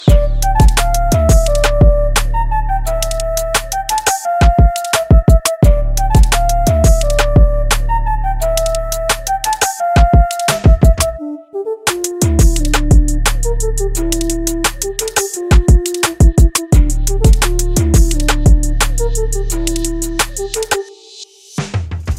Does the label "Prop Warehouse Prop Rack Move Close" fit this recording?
No